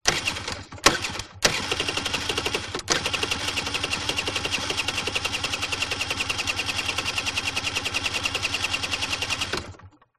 PIAGGIO ZIP 49cc Foley Flooded Engine Long Start Up Engine